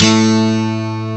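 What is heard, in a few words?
TWELVE STRING, GUITARS; CMI Sound Library Rev 1.3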